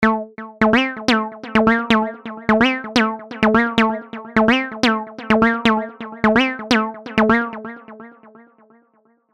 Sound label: Acid Loop A (128 BPM); Sylenth1; Ihr Werdet Weinen